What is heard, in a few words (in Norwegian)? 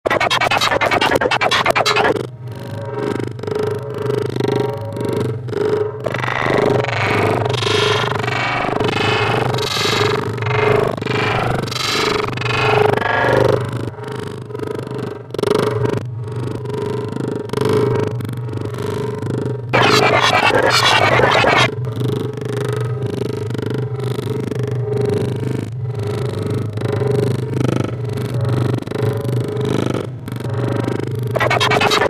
mini trommestykke; skranevatnet